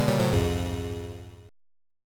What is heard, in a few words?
Music - Jingle